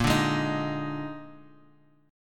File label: D sharp Major 7th Suspended 4th, A sharp